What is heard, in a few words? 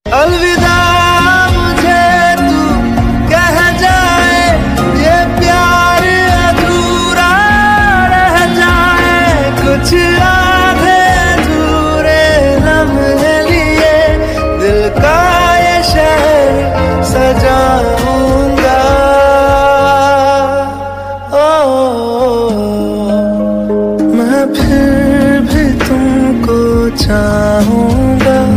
Most Romantic Indian Song Ringtone; ringtones